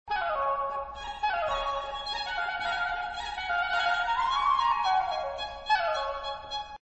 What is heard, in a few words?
D american baroque; MagnaLoops